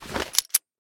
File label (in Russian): korotkij zvuk izvlecheniya obojmi